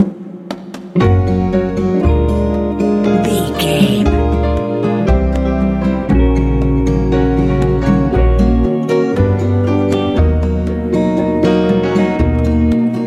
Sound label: good fun easy listening